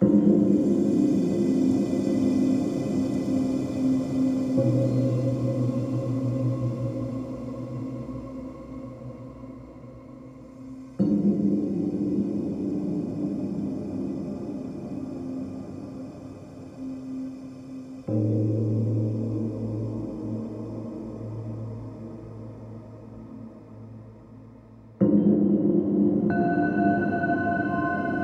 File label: Moon Ambience; game rip; lovecrafts untold stories 2